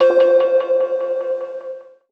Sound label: SYNTH (C); One Shots; Shaolin; Cymatics; Mystery Pack Vol 4